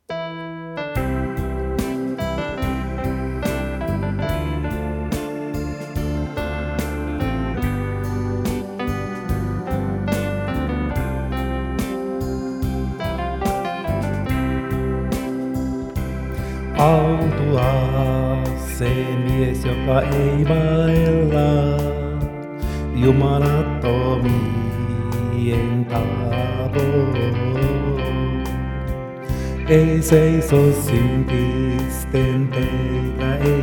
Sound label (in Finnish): Gospel Psalmi Live